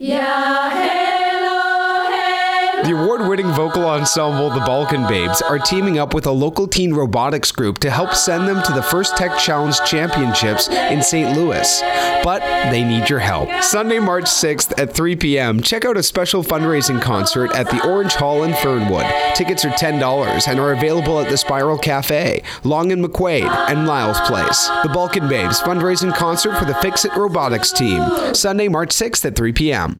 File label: concert